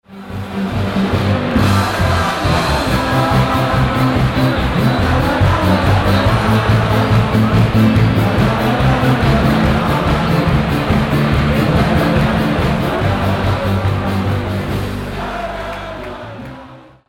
Festzelt, Oktoberfest; oktoberfest munchen; Oktoberfest 2010

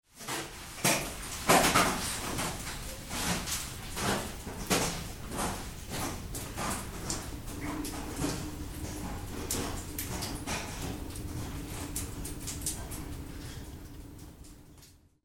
In der Wendelsteinhoehle; Geraeusche

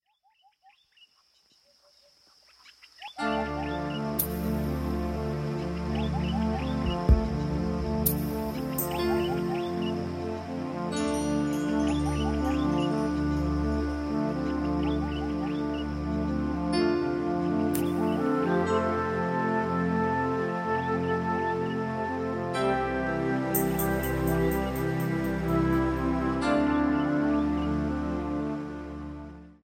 Instrumentalmusik Waldleben; musique instrumental

